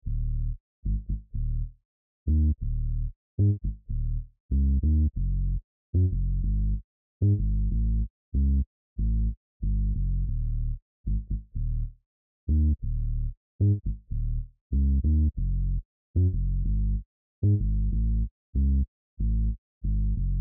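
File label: Bass 94bpm C minor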